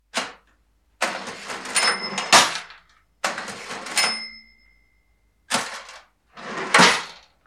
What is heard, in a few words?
cash itemizing machine